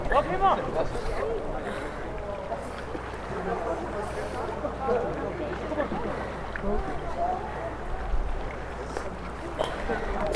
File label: People On Sea Side